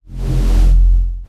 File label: crystal heal